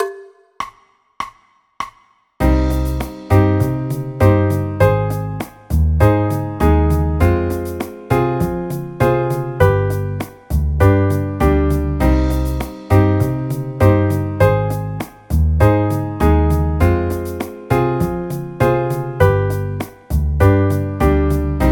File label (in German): c moll akkordverbindungen